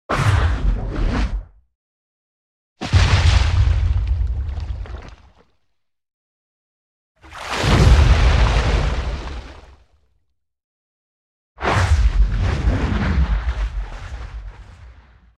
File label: Water - Small underwater shock explosion; Hollywood Edge Sound Designers Tool Kit